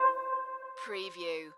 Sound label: musical